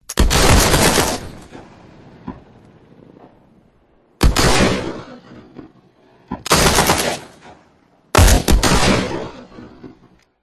sci fi guns shooting; Audio Mangler

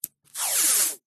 electrical tape pull peel from roll; industrial; zapsplat

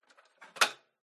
household foldable metal stepladders close

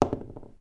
office eraser rubber drop on desk

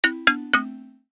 cartoon descend x3 short; zapsplat

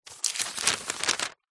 household magazine cheap page turn

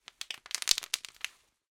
foley bubble wrap pops

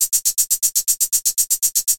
leisure game ticking timer or game show sound

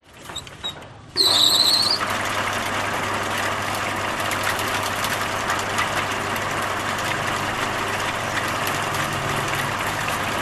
Wire Drawing Machine

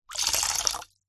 foley liquid water pour metal bowl short

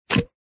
plastic cones stacked pull apart; foley; zapsplat

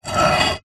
terracotta roof tile movement on top of other; foley; zapsplat

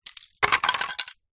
foley wood small thin pieces drop on hard ground; zapsplat

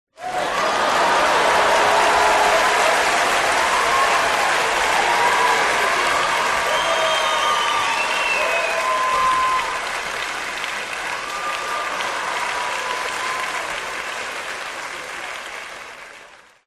large audience applauding cheering and whistling; Sound Ex Machina

